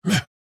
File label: AHurt